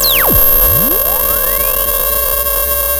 sound of a bike bell

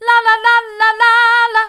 la la hard, vocal

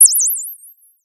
Sound of a bird tweeting nicely

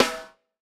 Rim, drum

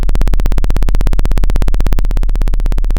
Sound of a plane taking off

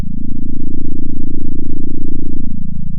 pop, sound of a small motorcycle

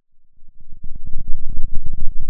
Sound of a thunderstorm, center

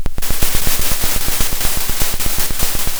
Sound of a drum roll

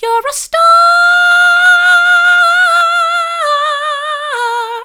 your a star mid, vocal

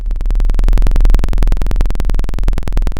pop, Sound of an engine revving

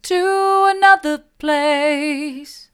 to another place mid, vocal

